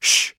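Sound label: sound when showing that one should be quieter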